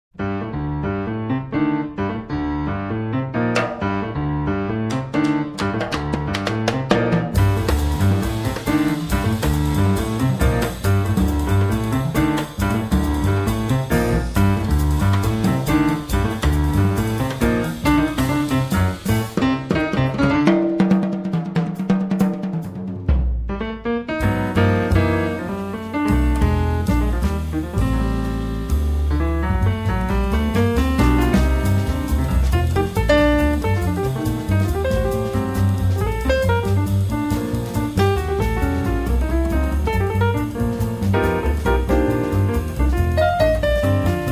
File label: jazz